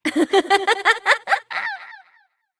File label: Evil Twin Doppelganger Laughter